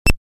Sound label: Soft muted beep